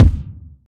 small reverb steel kick drum D# key, Royality free kick sound tuned to the D# note